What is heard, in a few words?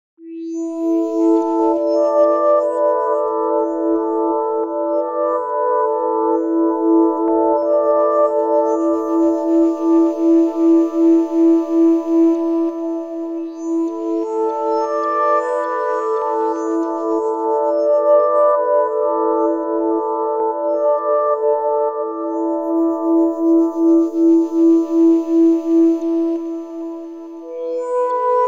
ambient